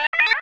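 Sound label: sphere blips medium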